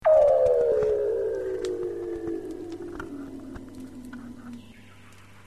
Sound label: call